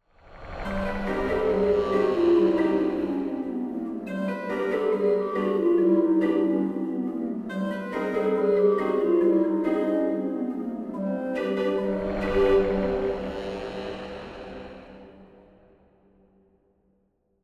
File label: Music Goblin Realm